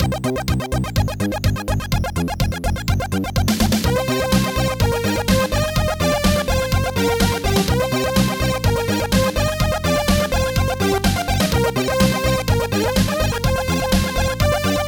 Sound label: mod funky